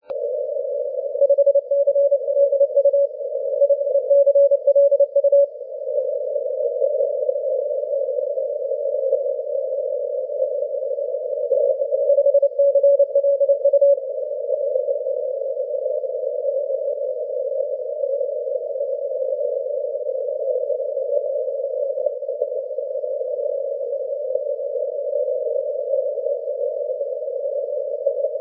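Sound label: 40m continuous wave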